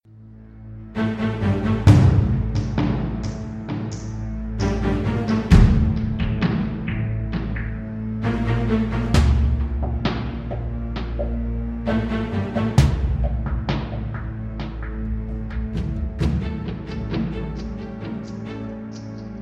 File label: bgm